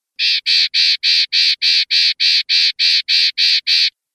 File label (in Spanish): jases, pardillo